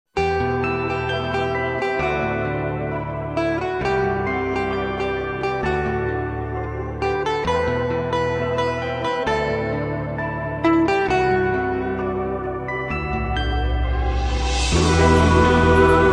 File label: love tune; jazz